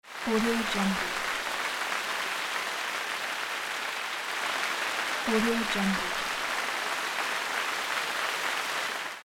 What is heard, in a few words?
applause